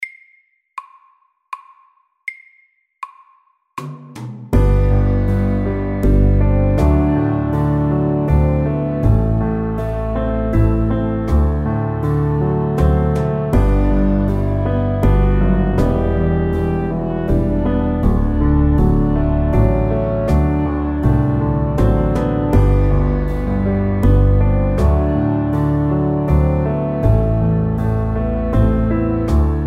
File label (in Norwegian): Soft rock MIDDELS